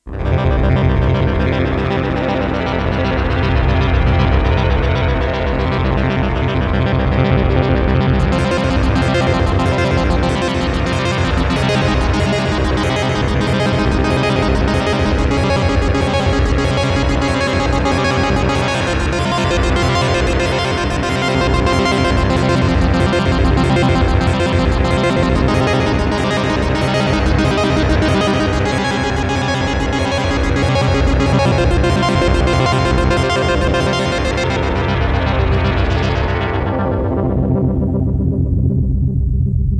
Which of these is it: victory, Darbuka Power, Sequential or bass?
Sequential